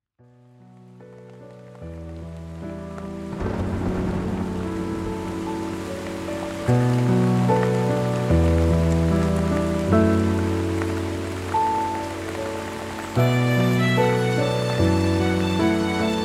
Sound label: rain lofi